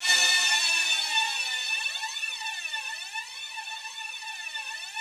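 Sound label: Yamaha VP-1, rough strings A4; 90 s Sample CDs; Music Store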